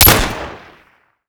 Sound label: pp2000, weapons; Modern Warfare